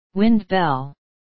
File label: wind bell